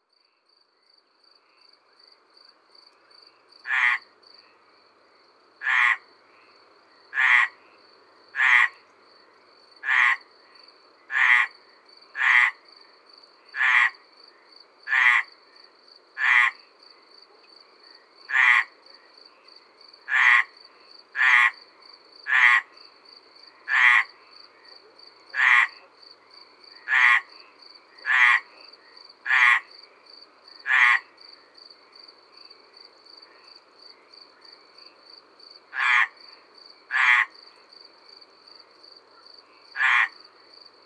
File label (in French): Rainettes meridionales au bord d un bassin Malarce sur la Thines Ambiances Sonores d Ard; Ardeche